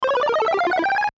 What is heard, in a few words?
Video Game Chime Ascend Descend; Video Games; Blastwave